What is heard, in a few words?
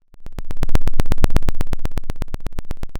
Sound of a thunderstorm, center